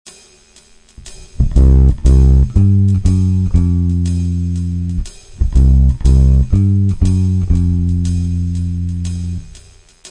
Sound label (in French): quarte, walking bass intervalle quarte